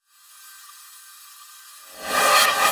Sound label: Transition Reverse Whoosh Reverse; Production Element